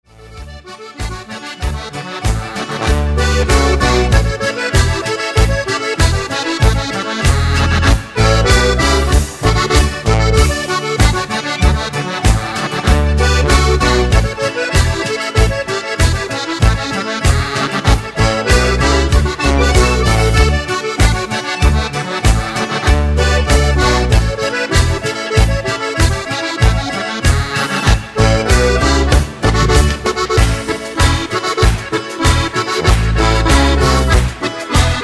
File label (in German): Boarischer